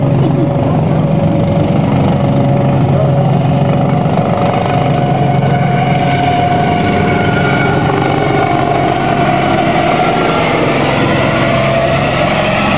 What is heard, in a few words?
HELICOPT